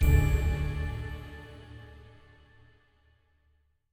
spin button quick stop; fairyred

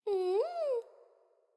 Princess big smile; koi princess

